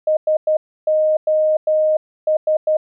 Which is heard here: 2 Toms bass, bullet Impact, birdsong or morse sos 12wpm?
morse sos 12wpm